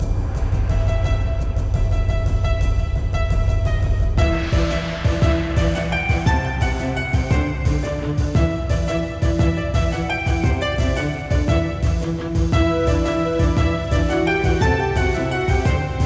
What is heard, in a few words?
Pirate; Guardian Tales